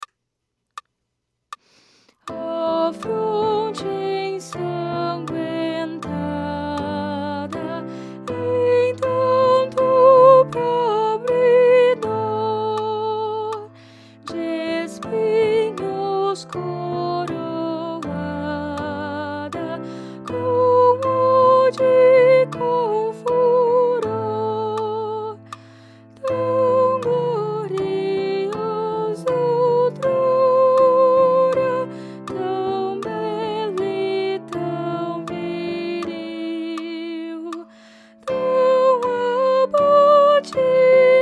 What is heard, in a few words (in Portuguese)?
Soprano; Oh fronte ensanguentada